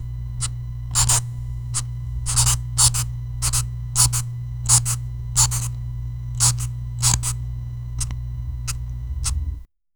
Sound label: marker marking sound, office